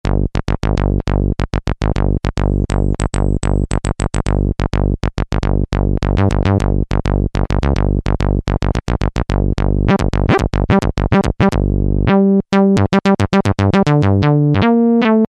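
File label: TB-303 Bass, Roland SR-JV80-06 Dance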